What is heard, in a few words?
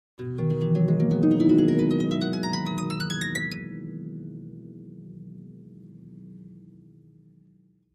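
Harp Ascending Glissando 7th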